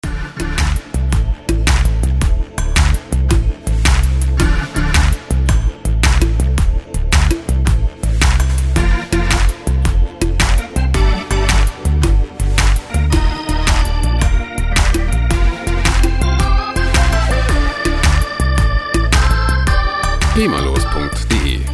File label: organ shuffle fire, rock